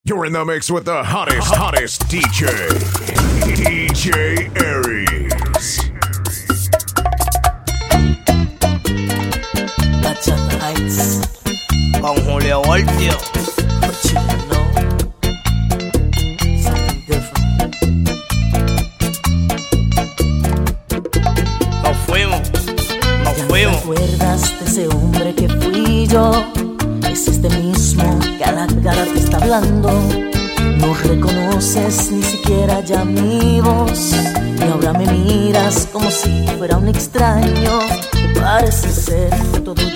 Bachata Hits Session

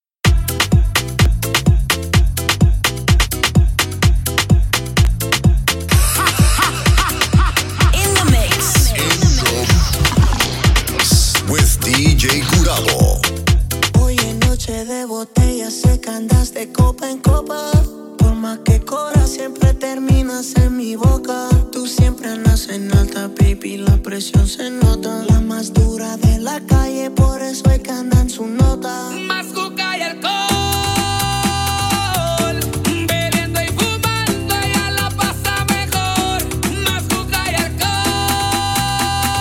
Guaracha Mix

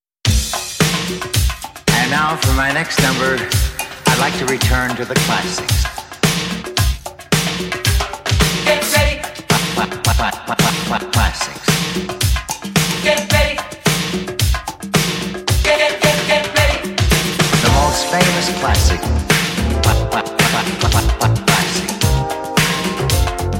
80s Hit

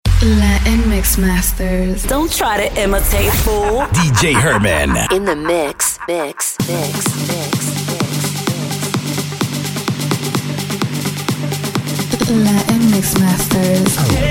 House Mix

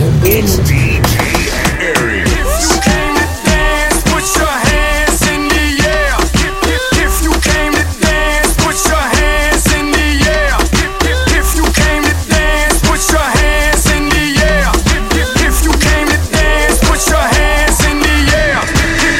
Latin Dance

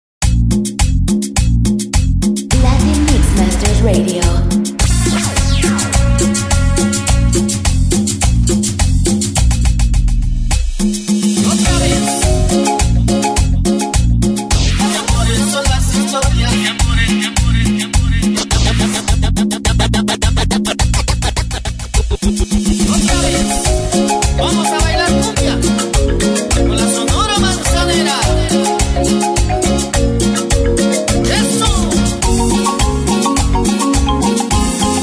MARIMBA MIX

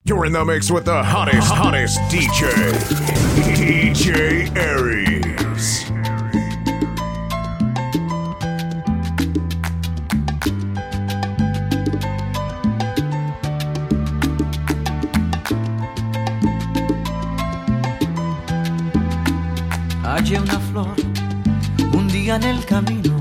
Salsa Classic Mixx

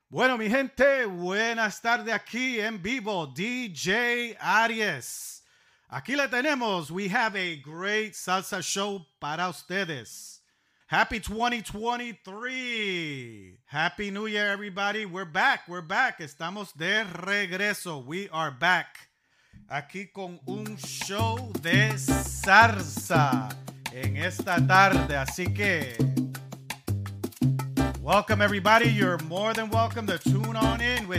Mixing Live Salsa; Podcast Show

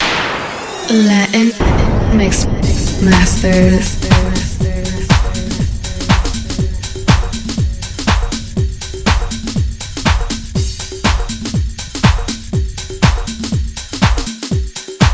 90's Dance Flashback Mix

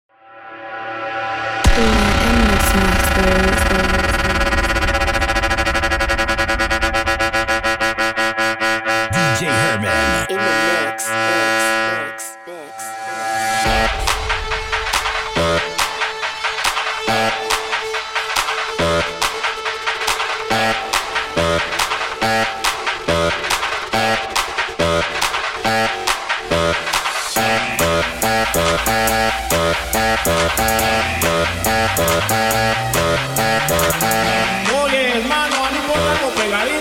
GUARACHA MIX

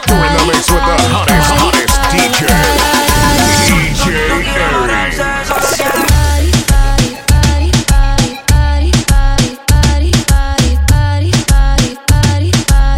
Reggaeton Jams Session

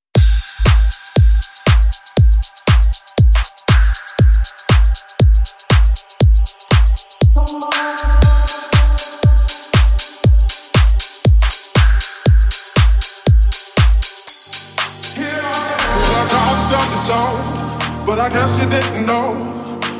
Electric House Mix

